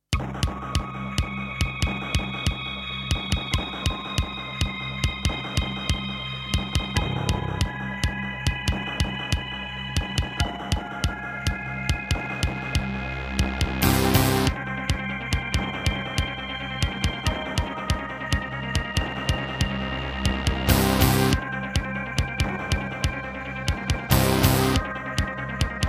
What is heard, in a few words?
IBM Aptiva SW1C; sigil doom episode 5